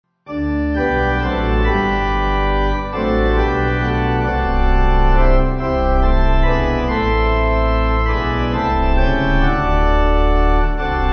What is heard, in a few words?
Pipe